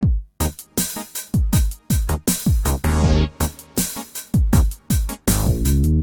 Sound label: menu bg; Formula Racing